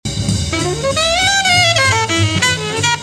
transition line

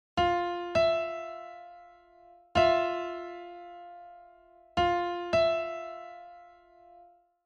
interval

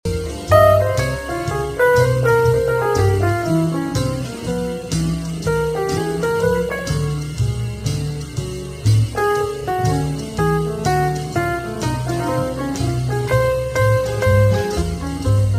phrase half speed

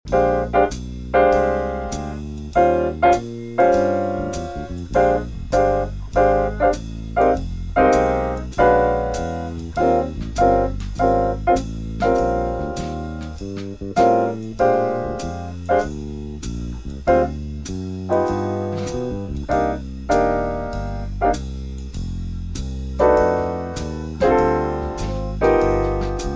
Bb Blues medium

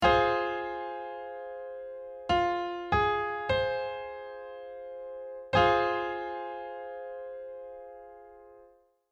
triad

